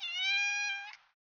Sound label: Kitty meow, Animals Domestic